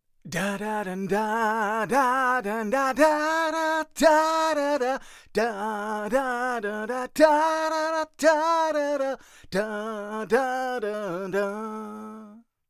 Vocals dry minus 2 normalized

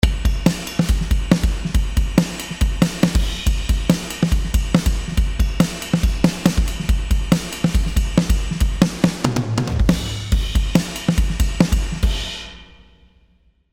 PD naked

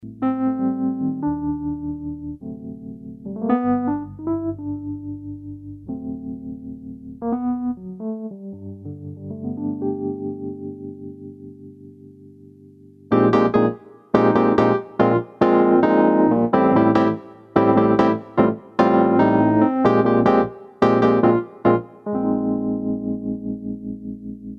Casio Privia PX-5S wurli

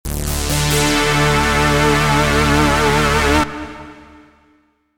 Synth

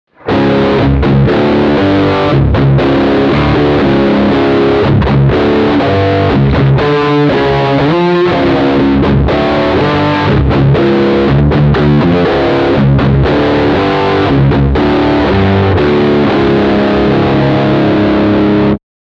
Riff three halftone

